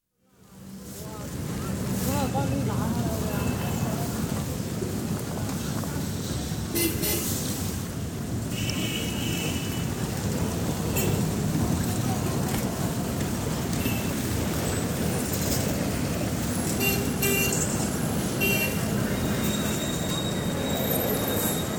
Changchun: Street atmosphere, with traffic, trolley buses & bicycles; BBC